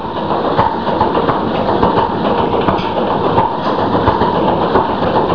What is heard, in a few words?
stork